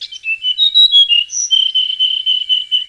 BIRD

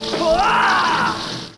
death; 5element

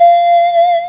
Panflute

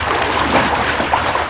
SPLASH

